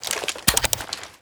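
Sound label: weapon foley pp2000 reload first lift; Call of Duty Modern Warfare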